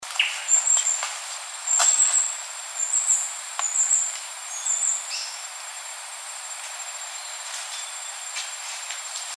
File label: yellow capped pygmy parrot